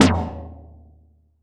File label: RESO TOM, Drum Kit; AKAI S-Series CD-ROM Sound Library VOL-4